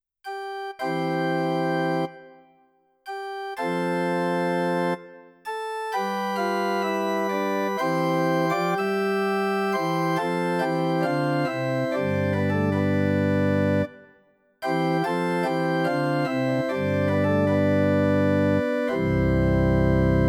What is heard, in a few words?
roland hp601 pipe organ